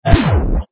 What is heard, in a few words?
energy gun